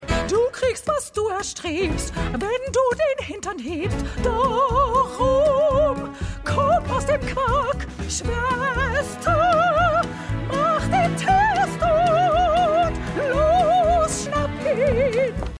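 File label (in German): Dorfsängerin; Schmigadoon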